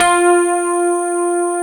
GRAND PF G 3, AKAI S-Series; AKAI S-Series CD-ROM Sound Library VOL-7; D.GRAND PF

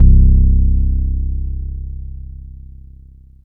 growl bass; Jungle

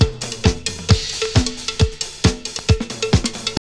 house loop

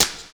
STONESNAR; Univers Sons

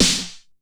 RM1X Drum Anlg Kit Snare Ana Gate; Yamaha RM1X

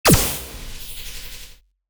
scifi marksman sound

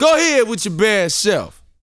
VOCAL F; ILIO

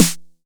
909 SD; Goldbaby Tape808 & Tape909; The Tape 909

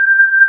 VOCA WAVE, Breathy Organ; E-MU Producer Series Vol. 2 – More Studio Essentials

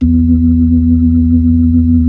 B-3

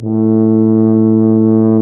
TUBA

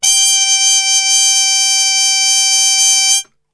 G 2, Brass; Best Service; Brass Super Section; TRP HARMUTE; 90 s Sample CDs

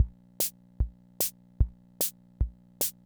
Kent K-220 Rhythm System Loop, drum machine; Kent K-220 Rhythm System Sample Pack; 300 Drum Machines

